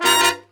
G DBL HIT; E-MU Formula 4000 Series Vol. 1; Hip Hop Nation; Booty Brass

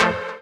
Dance Mega Synth; Best Service; 90 s Sample CDs

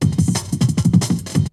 BREAKBEATS, TECHNO

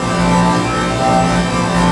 Dance Mega Synth; Best Service; 90 s Sample CDs

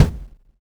Extract Kick; Sonic Foundry (Sony Creative Software); Drums & Drones; Construction Kits

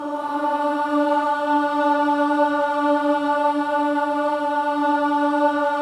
BOYS AHS, Symphony of Voices

